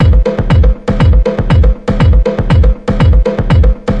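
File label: 120 bpm 4 on the floor; drum loops and breaks; ddt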